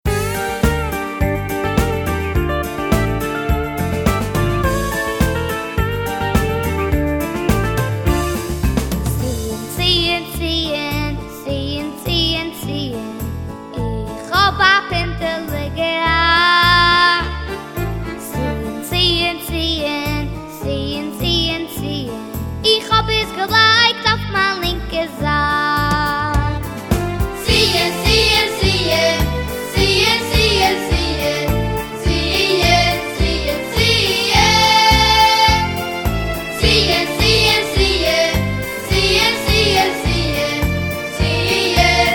niggun

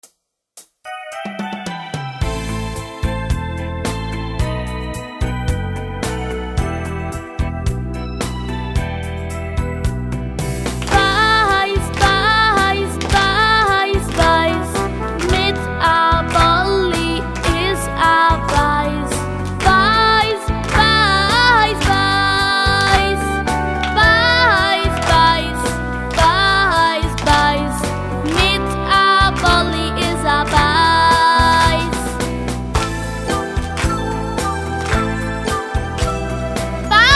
niggun

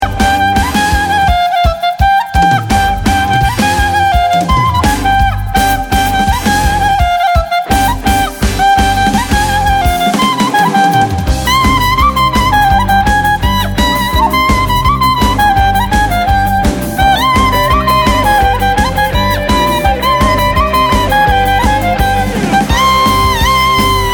nigun purim, instrumental

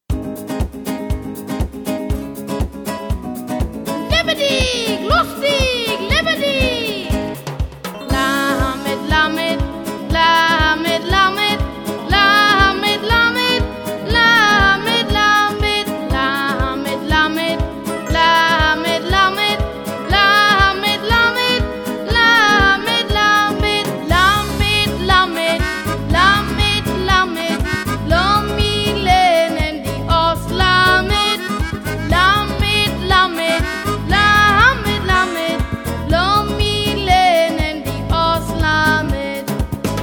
niggun

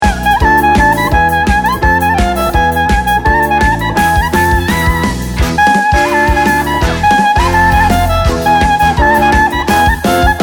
instrumental

